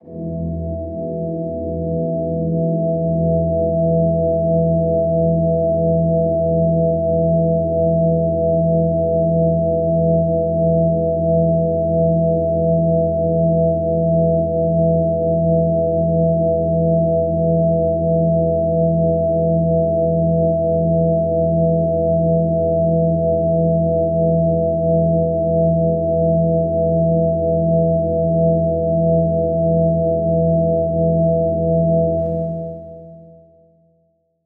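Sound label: Emajor Massive Harmonic Drone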